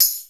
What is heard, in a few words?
TAMB; BEAT2000